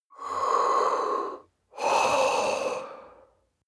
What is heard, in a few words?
breath male constricted